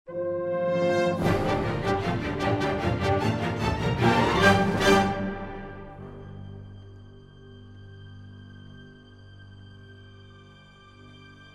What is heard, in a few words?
Music; Age of Empires III; cinematics